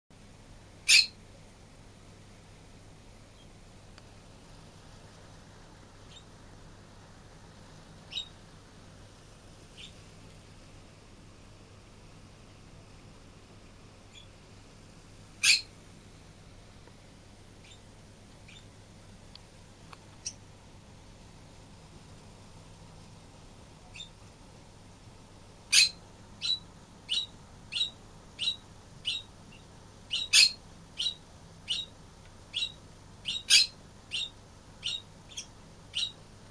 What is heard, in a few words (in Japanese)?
kuma nakigoe